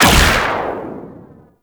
TnT Shot; Warframe; Weapons; Archwing; Powers

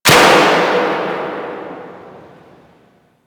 Ocean Light Clunk Small; Ambience; Warframe; Grineer Ocean

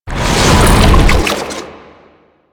Octopede Lash Limbs Whoosh; Warframe; Man In The Wall

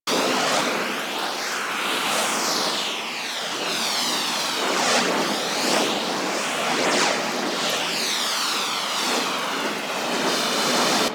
Immolation Loop; Warframe; Ember

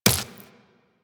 Run Flesh, Footsteps; Warframe; Tenno

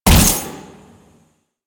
Layer Shot, Quill Dart Gun; Hystrix Prime

